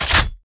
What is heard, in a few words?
reload, weapons; cstrike; valorant; prime vandal